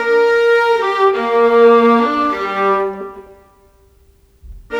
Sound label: Viola, Cinematic; Sonic Foundry (Sony Creative Software); Orchestral Series VOL-3 - Cinematic